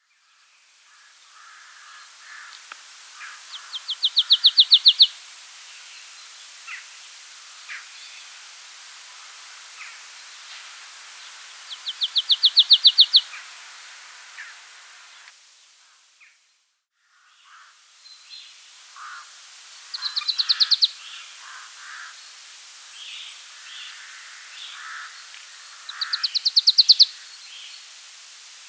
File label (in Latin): Poecile palustris; SETE